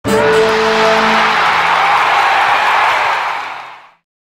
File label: Long Jump (Landing); Asterix at the Olympic Games